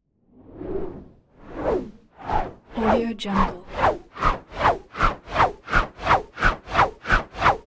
whooshes; transitions and movement

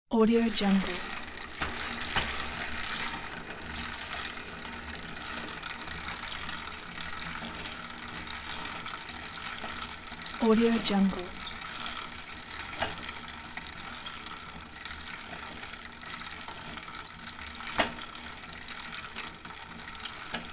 bike pedalling close slow speed; urban sounds

